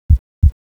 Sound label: heartbeat; cstrike